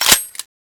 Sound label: de slide release, deagle